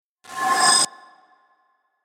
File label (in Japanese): 起動音 ショート